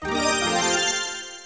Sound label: refine success; RO Sound effect